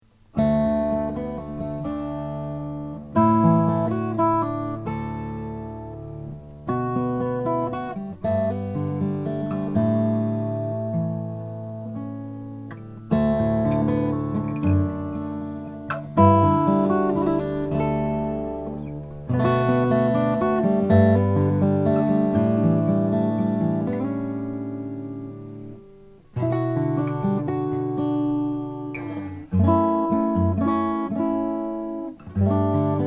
strahm eros brazilian rosewood

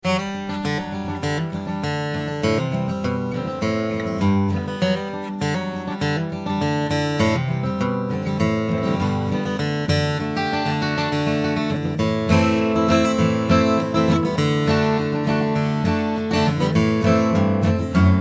2013 kovacik guitars pre war mahogany d

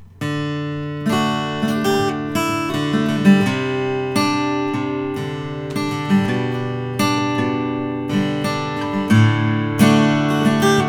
Collings OM42 adirondack natural

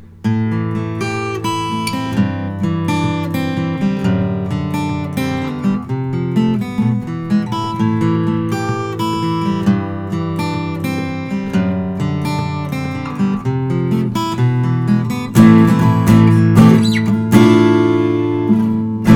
martin custom 00 45 adirondack; natural

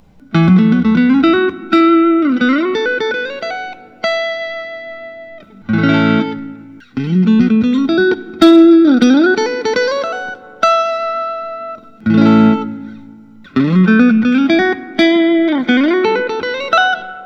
suhr classic s 3tsb

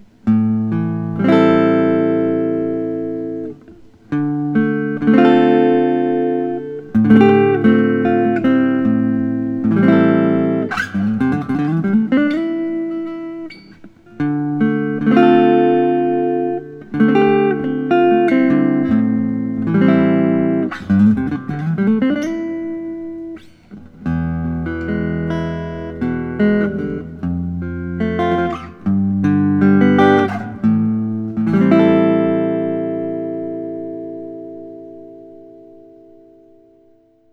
comins classic 17 sunburst

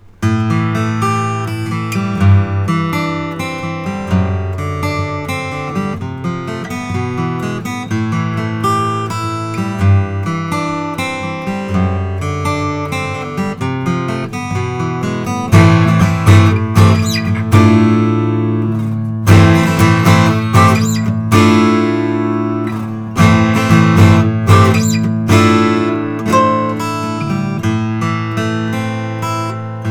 goodall koa concert jumbo natural

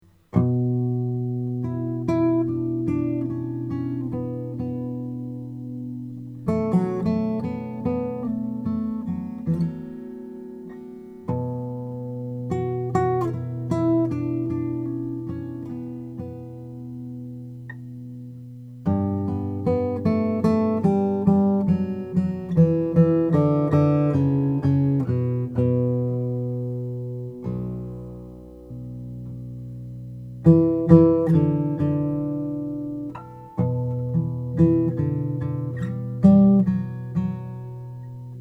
Zimnicki baritone cocobolo

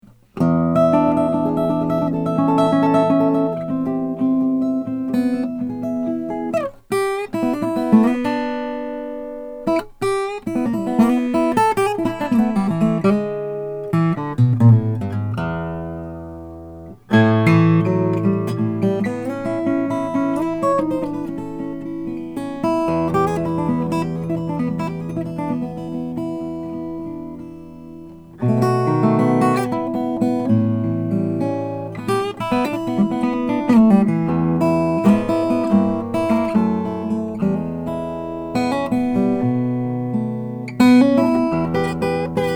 martin 00 42k figured koa

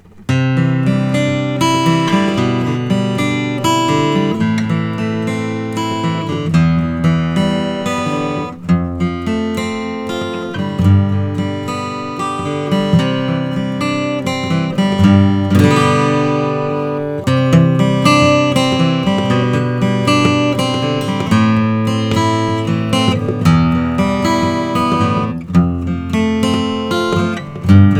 taylor gs custom brazilian

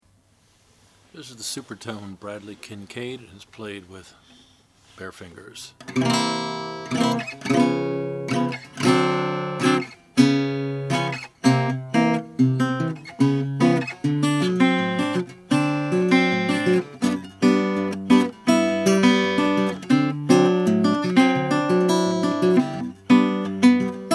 harmony natural supertone; 1929

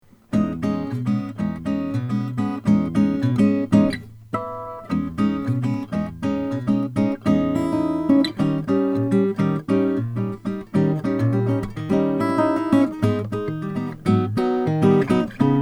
andrew white c ovankol

